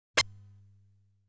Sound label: Damage Sound